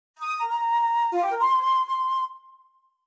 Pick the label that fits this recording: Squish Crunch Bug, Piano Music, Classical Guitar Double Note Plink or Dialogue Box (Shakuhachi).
Dialogue Box (Shakuhachi)